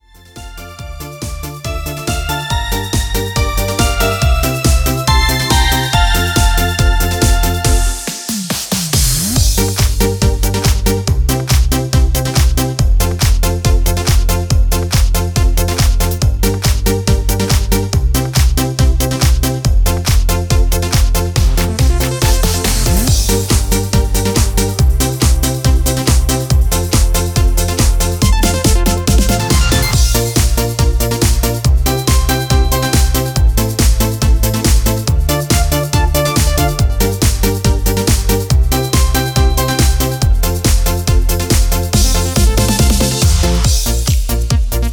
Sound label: Disco